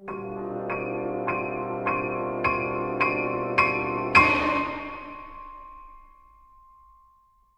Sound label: Suspenseful; Gynophobia